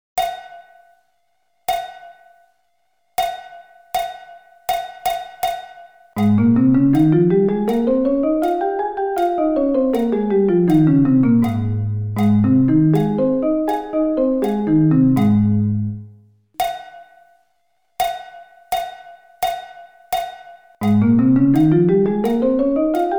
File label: Ab Scale